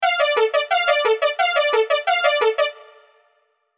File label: D minor arp